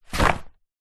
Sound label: wetsuit top drop ground; foley; zapsplat